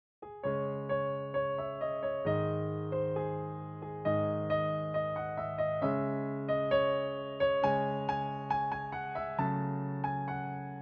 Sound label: guitar solo